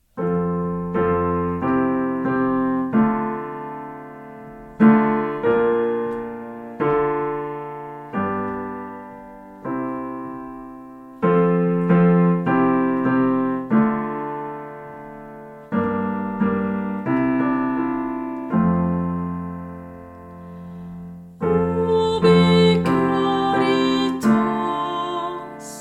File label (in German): Zwischenspiel